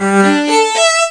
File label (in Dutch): viool; Play&LearnRC